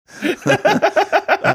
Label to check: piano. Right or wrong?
Wrong